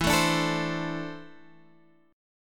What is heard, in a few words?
E 7th Suspended 4th Sharp 5th, down Guitar Drop D